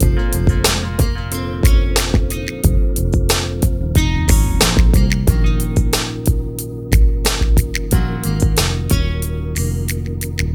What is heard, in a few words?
MIX, Hip Hop and R&B Drumloops